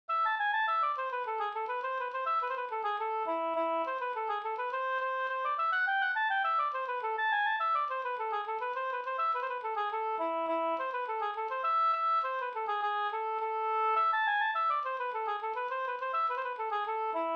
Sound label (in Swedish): slangpolska